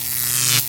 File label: ARC, INDUSTRIAL FX; Greytsounds